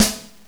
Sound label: tom